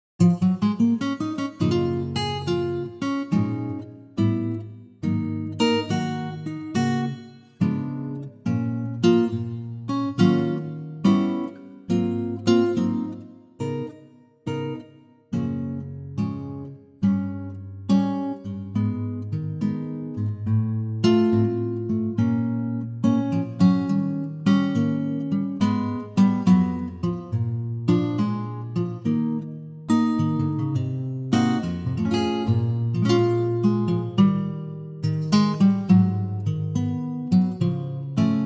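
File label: Gb acoustic guitar; key - Gb - vocal range - Eb to Ab; Gorgeous Acoustic Guitar arrangement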